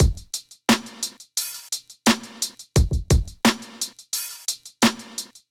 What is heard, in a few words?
Kit, hip-hop drums; Day By Day; Golden Era; Ableton; Sound Oracle